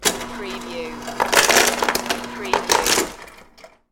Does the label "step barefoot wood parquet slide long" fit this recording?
No